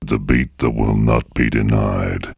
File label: Vocal; ZERO G - Datafile DISC 3